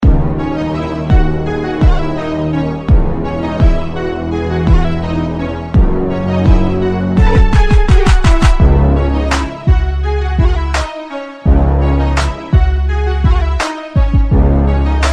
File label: arabic bass